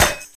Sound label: clap D key, Royality free hand clap sample tuned to the D note. Loudest frequency: 3829Hz; percussion; single hits one shots